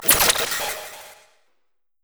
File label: sci fi laser in space sound; mixkit